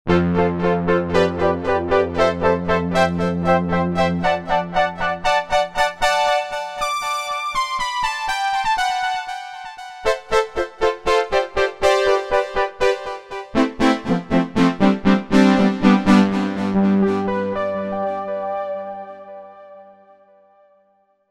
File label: Saw; Roland SRX-07 Ultimate Keys; Dreams Saw